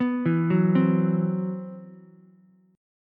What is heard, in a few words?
C Major 7th Flat 5th, Ukulele, chord; CM7b5 arpeggiated; 4,4,2,3; JGuitar